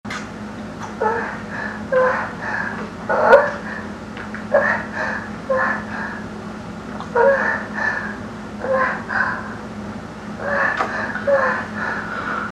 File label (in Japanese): えち音声; 鳥栖